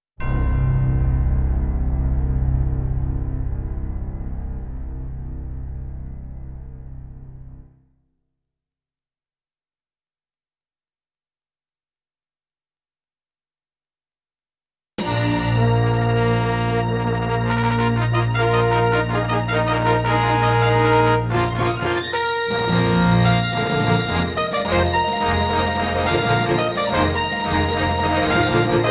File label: Intro, Roland SC-88ST Pro 55MAP; Dark Forces